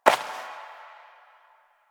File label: Step Dirt; Warframe; Koumei